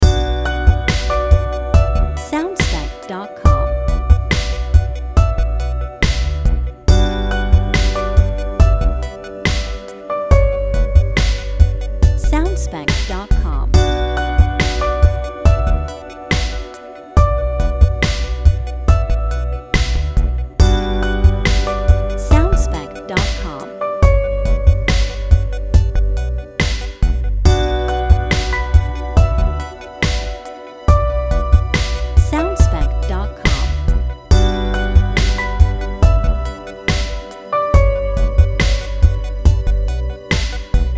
Time To Chill Out Short Loop Version

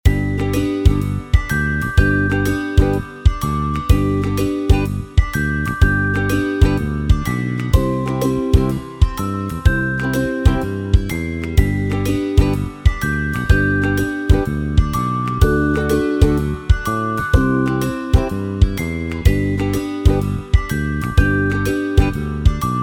Young and Happy Loop

